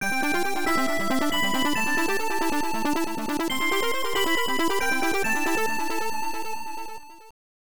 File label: Neo-Geo Sound Logo; Aero Fighters 2